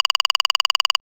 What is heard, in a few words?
scroll, interface sounds; kenney